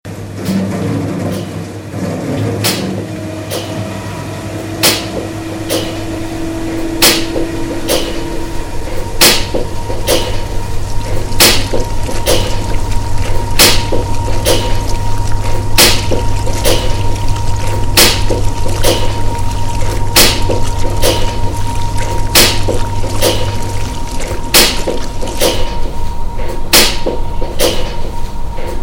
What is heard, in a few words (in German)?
Waschmaschinen Flugzeug; Musikprojekt La boite a lessive der Klasse 8L